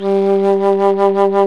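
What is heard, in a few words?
Alto Flute vibrato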